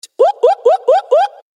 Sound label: monkey sound; animals